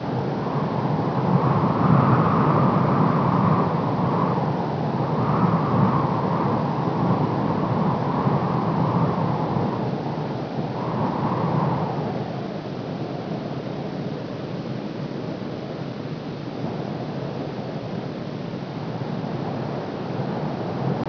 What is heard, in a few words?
field wind